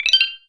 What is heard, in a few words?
sfx gem green collected